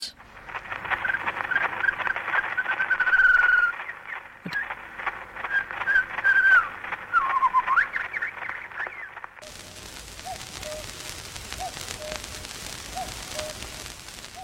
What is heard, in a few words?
1st bird recording; Ludwig Koch